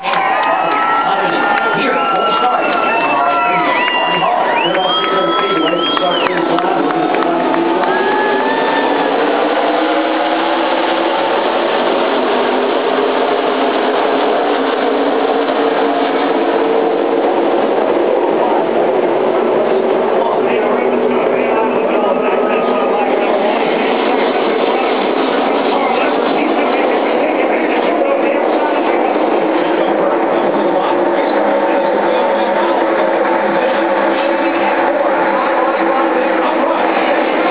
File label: nascar sounds